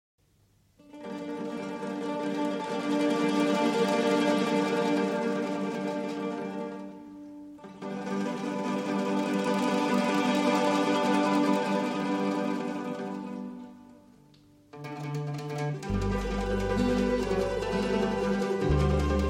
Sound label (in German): Musik aus aller Welt